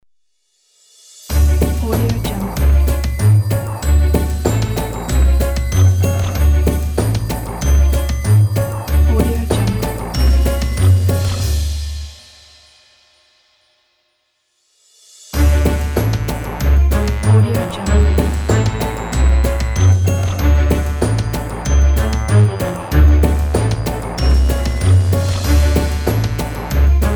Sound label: naughty santa claus cinematic christmas logo